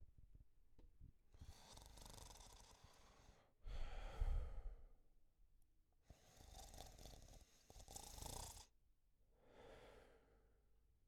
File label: Snoring